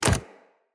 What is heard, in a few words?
companion step default, footsteps; Pets; Zanuka